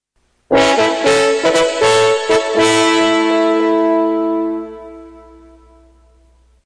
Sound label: Fanfare